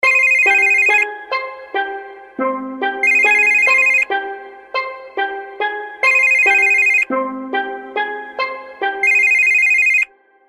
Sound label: Phones Ringing